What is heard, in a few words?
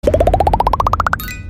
Star bubbles